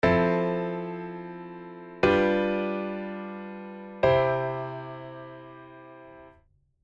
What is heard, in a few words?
fmaj7 g7 c